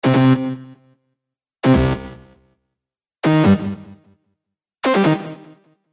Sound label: Error Notice Sounds, dong